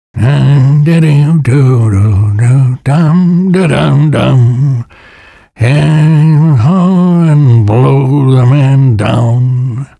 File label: fish whistling, fisherman; lostcoast